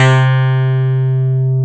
RESO CLAV, swing synths; Zero-G - Funky Elements

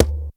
CONGA PALM; Greytsounds VOL-1

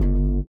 LIQUITAR; Spectrasonics; Metamorphosis

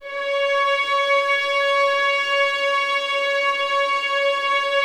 violins, C#4; Best Service ProSamples vol.51; Classic Orchestra 2

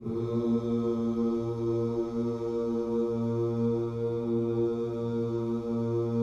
OOS, Gospel; Spectrasonics Vocal Planet

